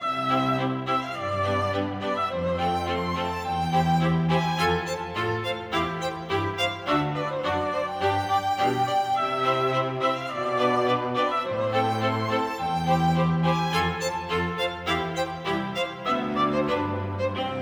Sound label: Quartet 105 Bpm 67beats; Chili Inc